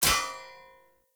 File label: Short Sword Throw Medium Impact Metal; Player